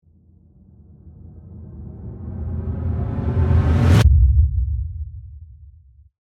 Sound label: Ramp To LFE; Production Element; Ascends (Sound Effects:Sound Design); BlastWave